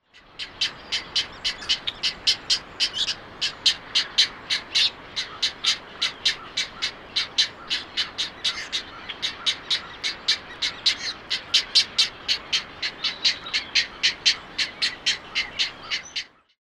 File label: chick calls egret, great egret